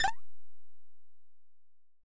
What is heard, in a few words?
jump